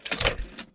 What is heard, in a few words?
lever